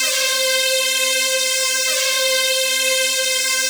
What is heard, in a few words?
Techno Violins, евродэнс